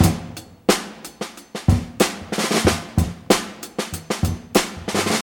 94 bpm drum beat f sharp key, Free breakbeat sample - kick tuned to the F# note. Loudest frequency: 2076Hz